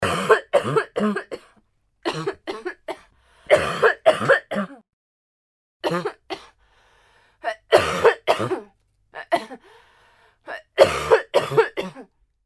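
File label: Female cough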